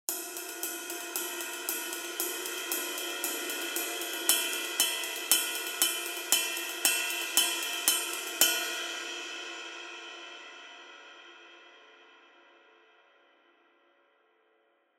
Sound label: Custom Ride 22 Extra Thin